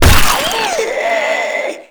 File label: Inked Humanoid Death; Warframe; Shadowgrapher